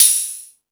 Space Drums, E-MU Pro-Cussion; drum machine

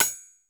DYNA PERC; Crypton - DrumBase5000; DYNA KITS

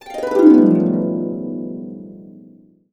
B, Harps; USB Soundscan vol.62 - Complete Harps; AKAI

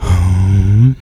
vox, Human Perc

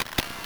Camera; Genesis

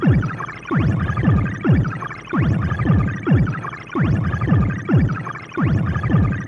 Crude Tape Loop, Tape delay; radiosonics

